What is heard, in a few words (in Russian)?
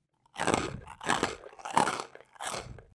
hrust suharej